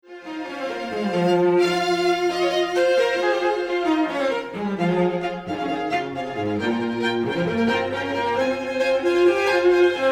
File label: String Quartet